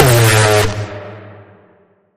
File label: Bend The Alarm A